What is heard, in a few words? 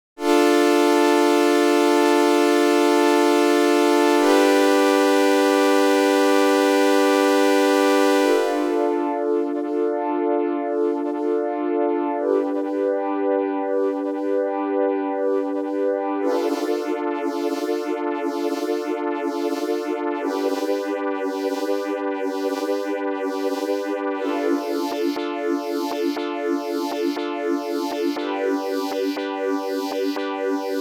Phaser Pad; CHERRY AUDIO RACKMODE